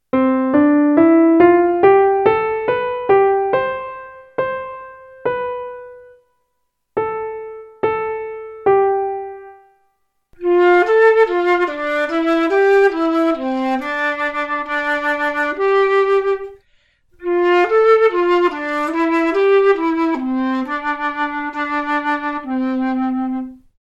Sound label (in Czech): Klavir Pricna Fletna C1